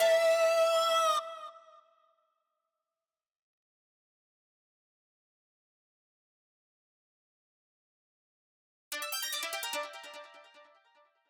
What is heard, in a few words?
Frantic Mix Loop Stem Layer 2; Centipede Recharged